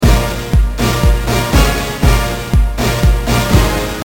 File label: Rave